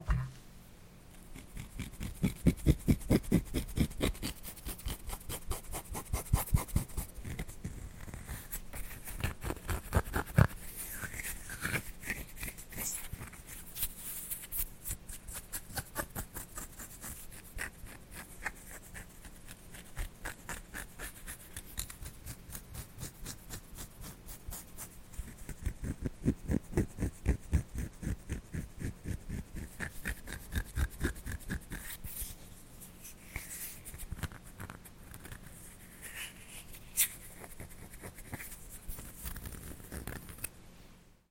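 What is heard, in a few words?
ASMR scratching sound of dry skin on hands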